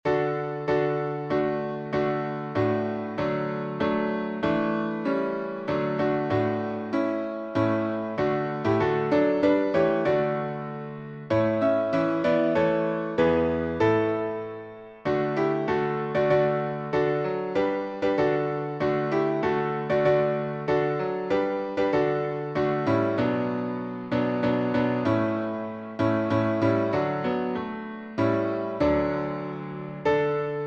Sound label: piano synth; Little Free Music Library